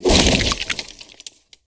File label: Crates n Boxes, destructibles